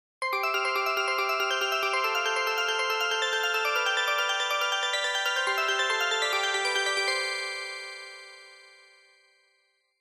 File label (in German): Wind